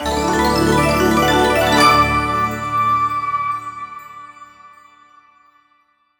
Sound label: win Large; imperialriches